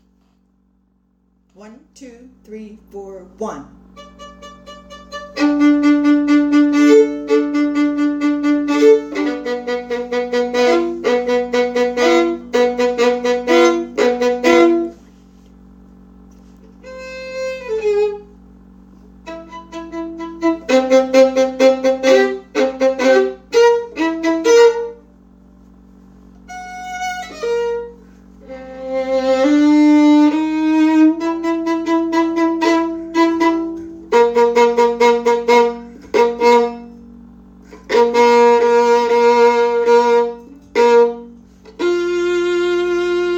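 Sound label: violin 2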